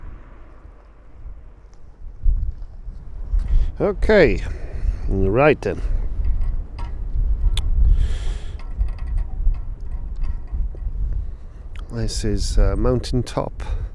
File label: mountain sign blowing in the wind